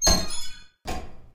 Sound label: cube trapdoor open; underground